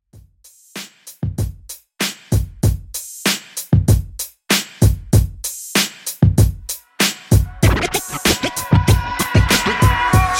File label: Old School Redrum 96Bpm Dirty